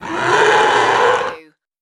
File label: creature vocal